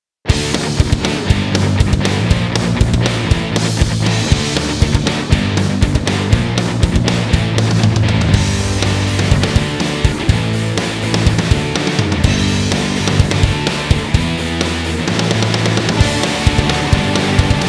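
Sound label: Instrumental Rock Cover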